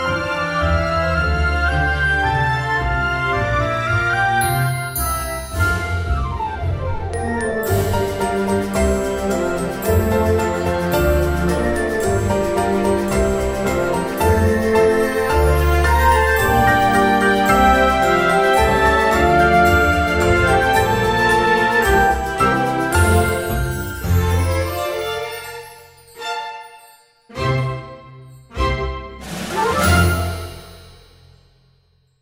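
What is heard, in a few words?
instrumental version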